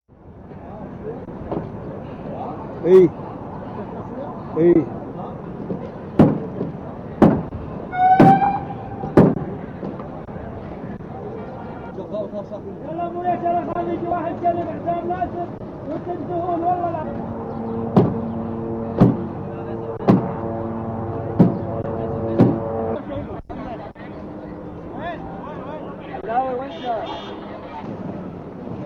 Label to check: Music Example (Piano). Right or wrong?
Wrong